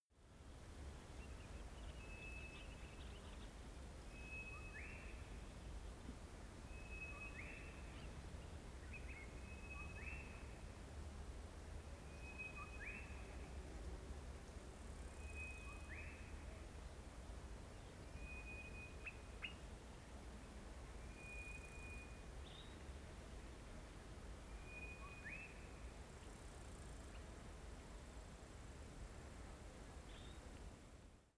african bush midday birds distant

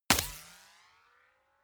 bullet small impact ricochet

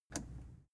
plastic button scratch release enable

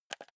console controller joystick press fast

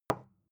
bottle put down

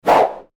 fight swish noisy fast

